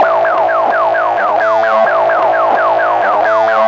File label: Mutha Funka, Underground UK House & Electro, Synths; Sonic Foundry (Sony Creative Software)